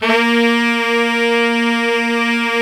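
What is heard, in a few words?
TENOR SAX, Sax section; Giga Samples Collection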